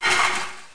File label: Stair Step